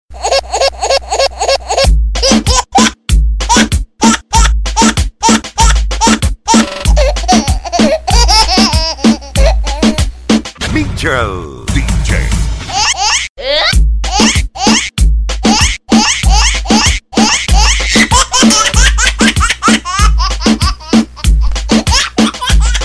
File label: Baby Rap